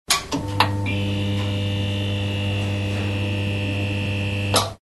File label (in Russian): Звук работающей неоновой лампы